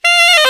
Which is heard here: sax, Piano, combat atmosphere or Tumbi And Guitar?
sax